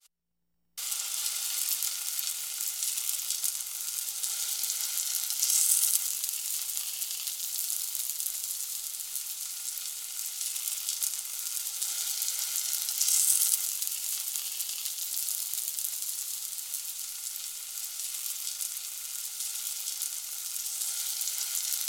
Cooking In Butter

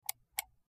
Highpitched Mouse, mouse

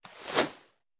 Arrow Sizzle Bys Medi; bow & arrow bys

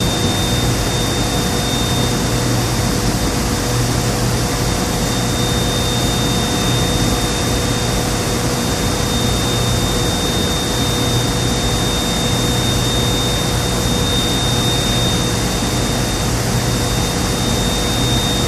Petroleum Plant Hum

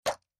Cork Pop; eating & drinking

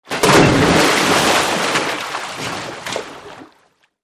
Water Splash Big Fro

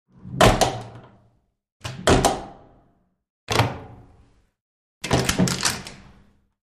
Door Plastic Pvc Open

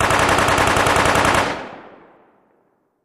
Machine Gun Sngl Rap, single burst

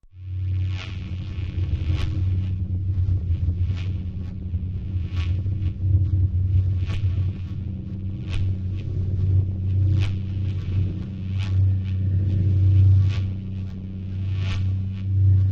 Bees Jar Buzzing Mvmt, buzz

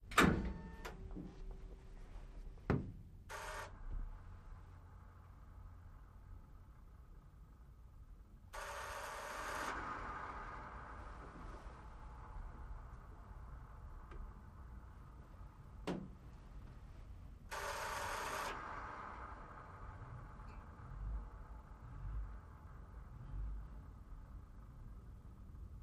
M60 Tank Tank Radio

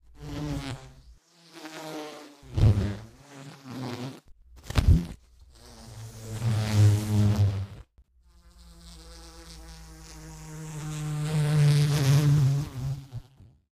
Insect wings big bug reproduction

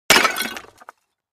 Clay Chimney Tube Hit

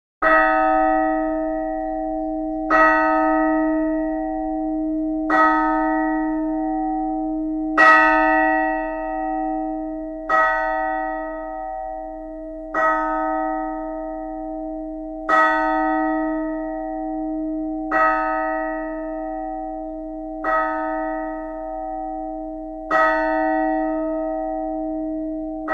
Church Bell 3 Large D3, bell towers

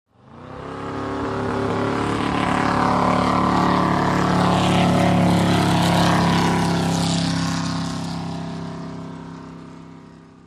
Drag Boat Start by

